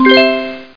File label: PIPIPIN